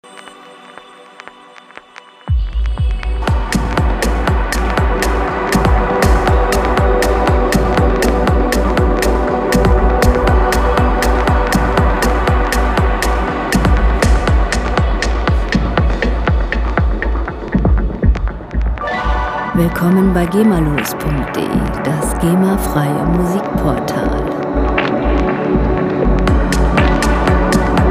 cosmic noise

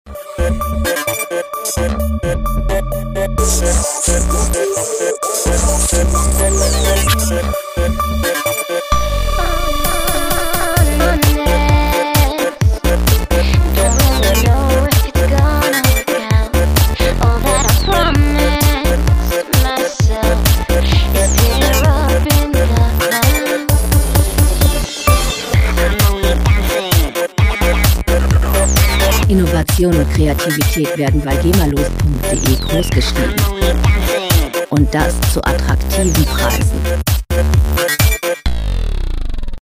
raver

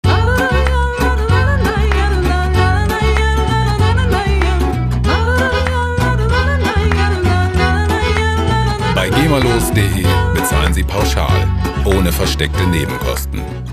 bulgarian folk

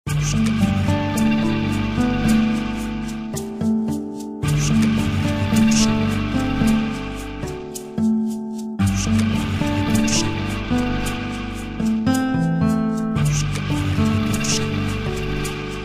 relaxed

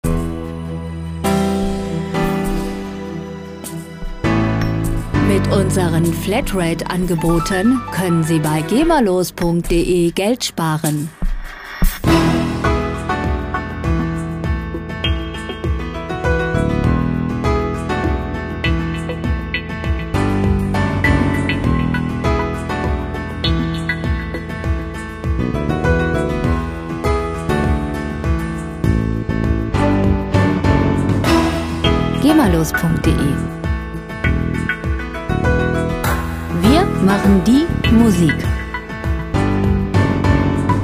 dynamic piano

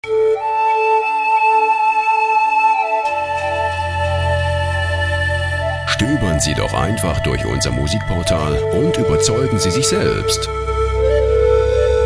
panflute

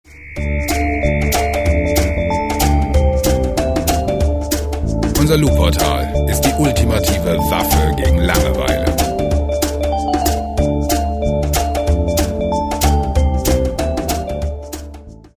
tomtom

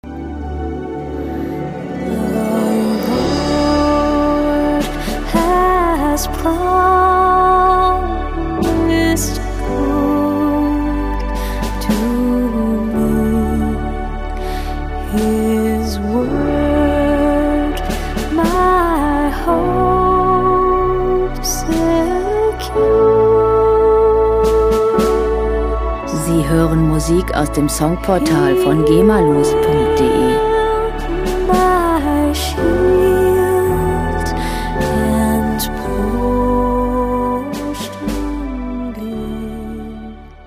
amazing grace song

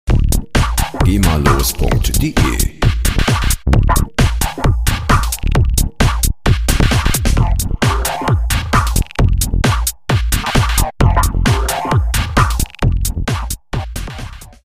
rhythm g

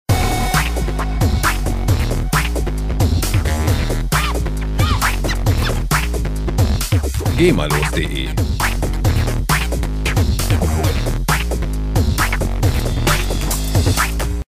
break attack

